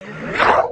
vo maelstrom shot; creatures organic

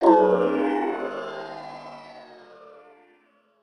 stun; creatures organic

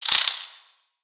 Spider Alert; creatures mechanical; Lego

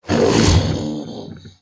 Pet Croc Alert; creatures pets; Lego

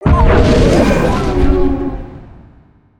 death, creatures organic

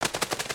wing flap loop long medium; creatures organic

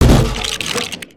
cans plastic; destructibles

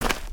Footstep Dirt; creatures pets

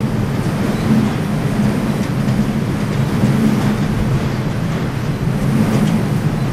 wind for fan loop; ambience avant gardens

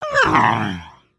Idle, creatures organic

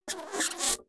Cam-bot Turn, creatures mechanical

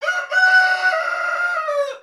crow; creatures organic

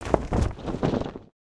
Flag Flap; ambience ninjago

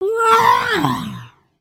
Stun, creatures organic

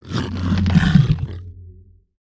idle, creatures organic

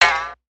Frog Hatchling Hop Jump; creatures organic

